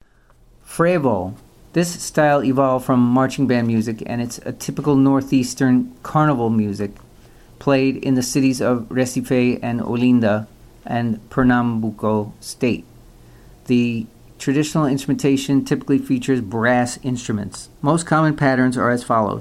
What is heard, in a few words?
brazilian styles frevo